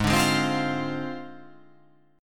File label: G sharp 6th Flat 5th G sharp; strummed; JGuitar